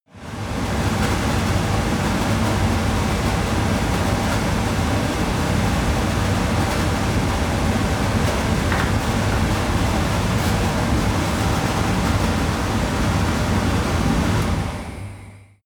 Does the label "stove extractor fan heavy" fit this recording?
Yes